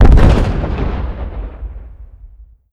underground low, Explosions

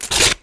hit; Rust

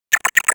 drone chirp

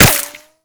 impact dirt; Modern Warfare

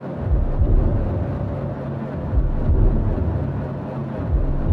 submarines engines; World Of Warships

